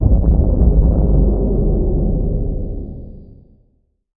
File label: anime mmorpg immunity ski, energy sweep